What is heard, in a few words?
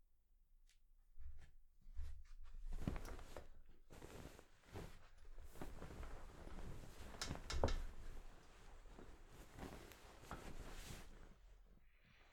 Going To Bed Waking From Nightmare